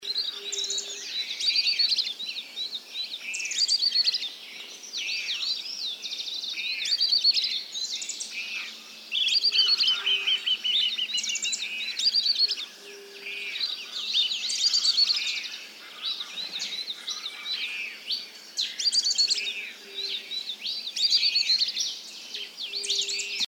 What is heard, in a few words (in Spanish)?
Piojito Vientre Blanco, Serpophaga munda, White-bellied Tyrannulet; cantos